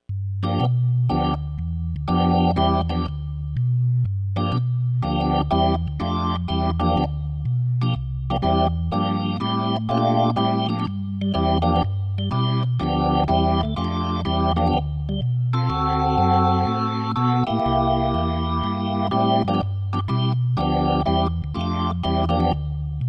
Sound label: CX3 Dirty